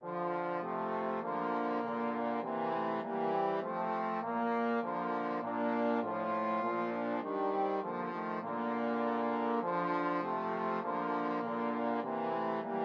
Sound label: trombone trio